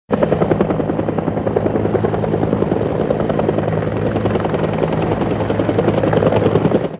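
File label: military chopper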